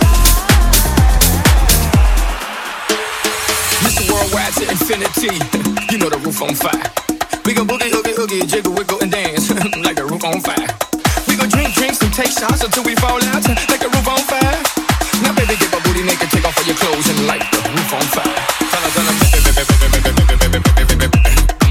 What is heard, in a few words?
Tech House 125bpm